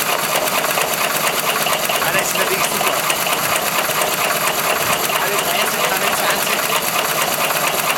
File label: Traktor Porsche